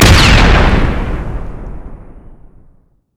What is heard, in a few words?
q attack; LoL; jinx base